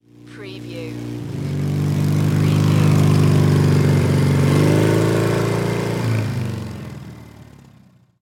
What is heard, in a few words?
Motorcycle Pass By